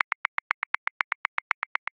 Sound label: Rock Slow and Loose 16ths; Grooves; Ableton Live 12 Lite; Core Library